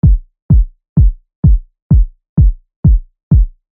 Mid Kick

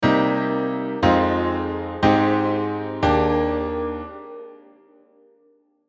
7 Chords Different Voicing

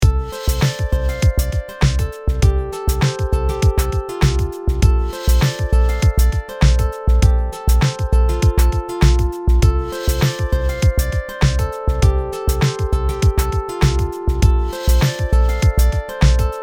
Shimmering Arp Dry Wet

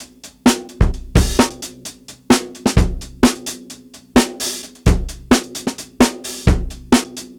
Break Techno Tempo 130 bpm

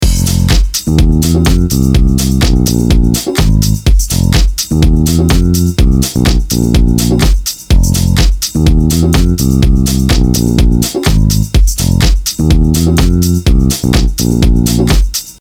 Disco House Bass Example

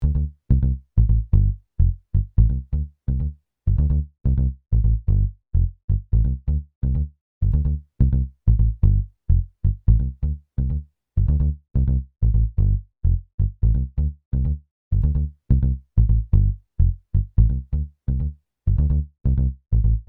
Prog Bass Soloed

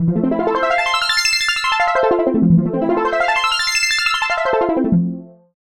High Resonance

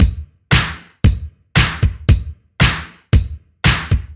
80s Post Disco

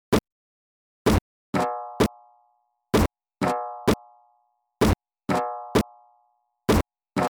Techno beat with field recordings perc hits

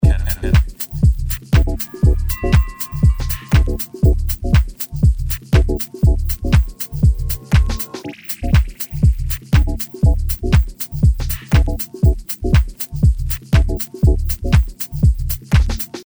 Full Loop

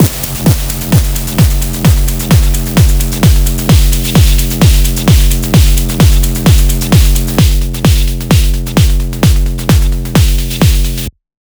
Drum Bus Drive Falling

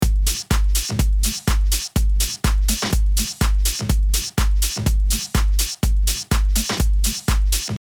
unbypassed with compression

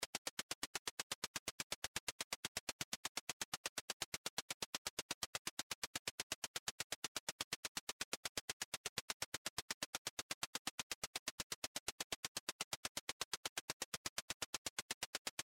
FILTER

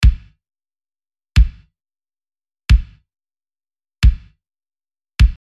bd synthwave drums

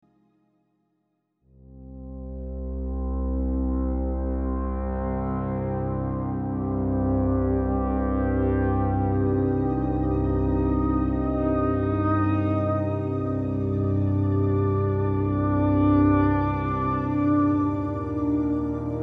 Pad Shop

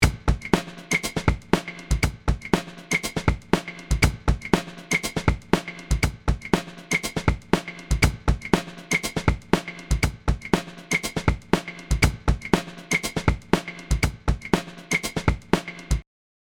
Drums LA2

